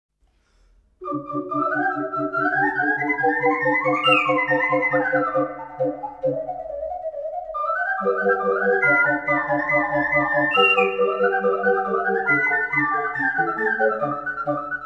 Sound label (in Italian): Scivolando, ocarina